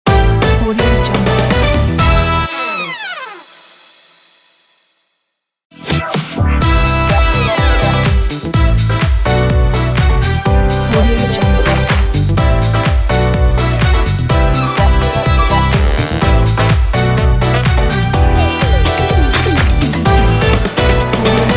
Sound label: uplift disco opener show